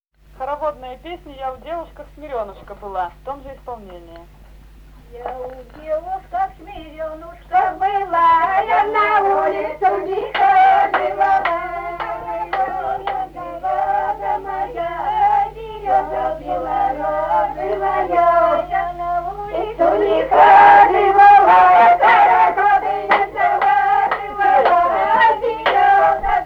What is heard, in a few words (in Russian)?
карагодная; Я у девушках смирёнушка была